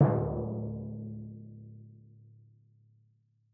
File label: Drum Timpani D